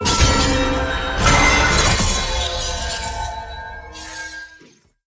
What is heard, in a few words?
minigame SFX assist banner; Irelia; bloom minigame